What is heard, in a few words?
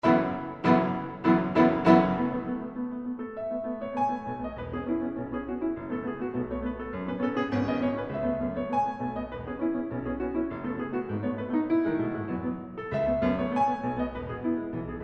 Galop, Piano Duo